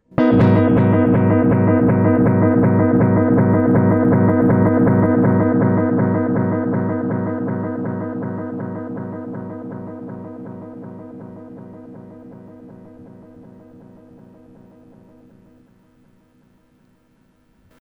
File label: delay, PT2399